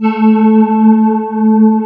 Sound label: CMI ORGAN